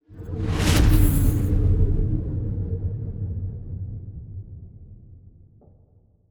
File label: destroyer move; aquatics